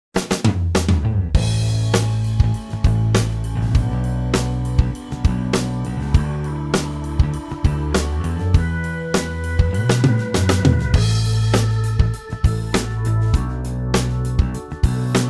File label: Roots Rock Band A 100, backing tracks